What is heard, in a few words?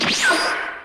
teleport sound; goku